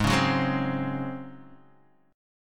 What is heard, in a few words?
Db Major 7th Suspended 4th Ab